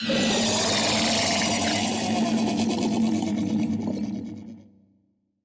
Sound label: cutholoids death; Stellaris; grand archive; ships